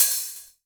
hi hat open, Korg Electribe ER-1

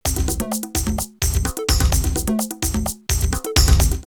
Rave drumloops 128

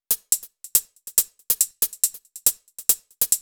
Hh Loop, Drum Loops 140bpm; Zero-G Trance Inducer

